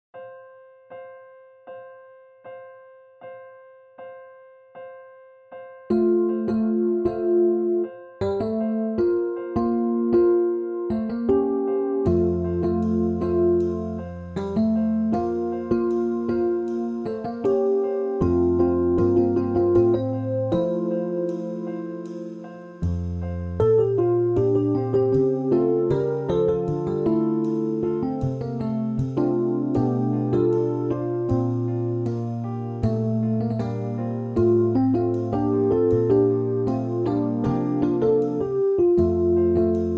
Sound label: piano